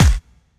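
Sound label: snare drum bass drum, Dance Mega Drums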